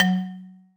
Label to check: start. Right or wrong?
Wrong